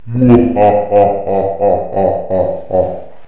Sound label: Evil Laughing